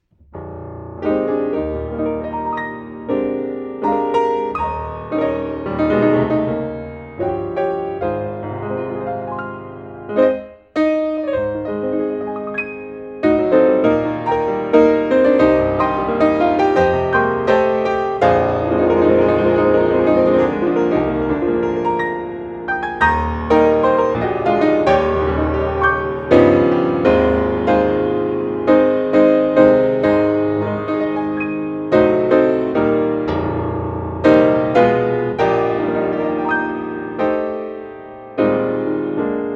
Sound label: Gospelpiano Old style; rubato